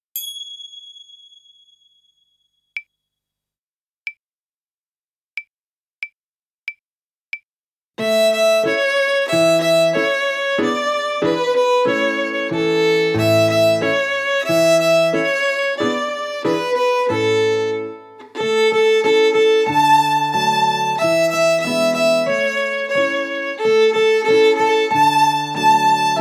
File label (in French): Jeu denfant Violon